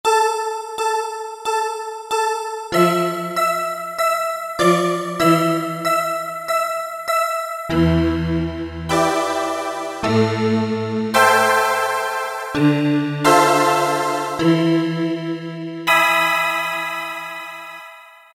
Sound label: Brightness, Roland SC-50 Sound Canvas; Factory sounds